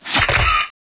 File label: biorifle magout; weapons